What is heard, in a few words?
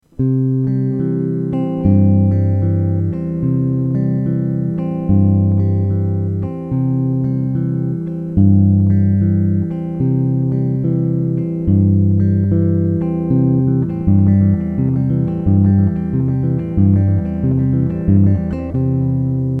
bass